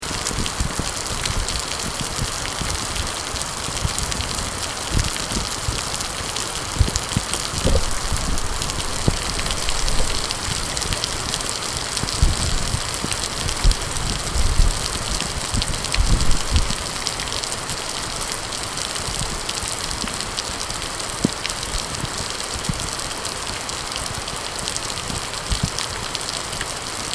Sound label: rain